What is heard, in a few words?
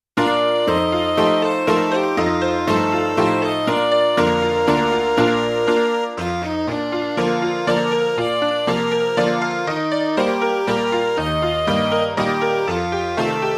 midi